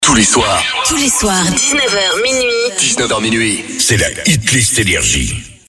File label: Acap; NRJ